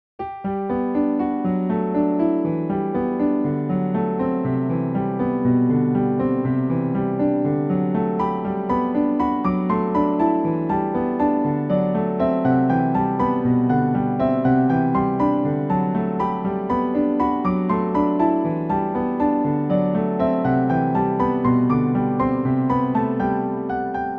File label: rainy piano